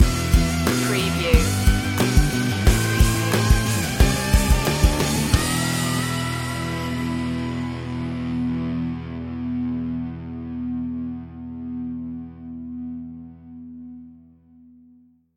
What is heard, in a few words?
biker babe stinger